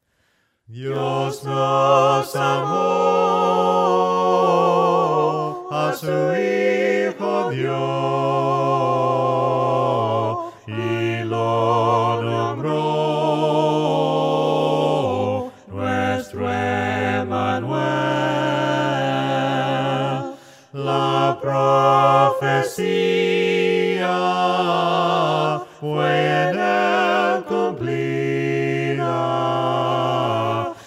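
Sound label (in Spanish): VOCES, himnos a capella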